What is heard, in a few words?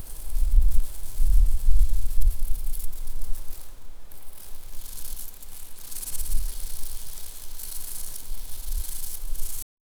sounds of grass branches